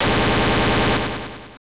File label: hellstream